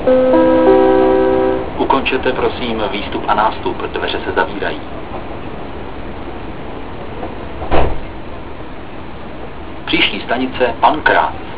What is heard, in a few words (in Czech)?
odjezd, metro; Ukončete, prosím, výstup a nástup, dveře se zavírají. Příští stanice Pankrác